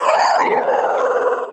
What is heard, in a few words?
wookiee m attack